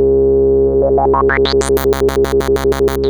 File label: MOOG C4, Synthesizer; Hollywood Edge; Giorgio Moroder Rare Synthesizer Collection; MLTI.MOOG